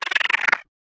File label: Bug Die